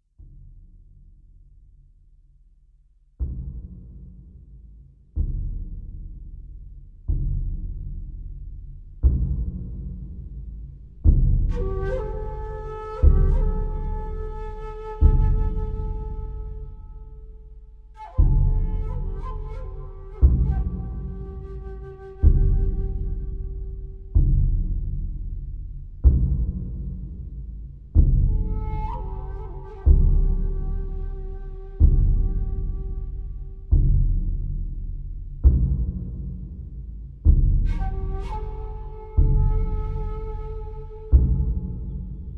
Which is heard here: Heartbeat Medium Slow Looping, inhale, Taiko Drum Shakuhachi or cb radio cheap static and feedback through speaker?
Taiko Drum Shakuhachi